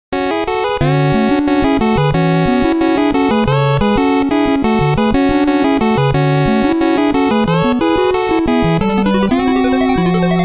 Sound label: chiptune remix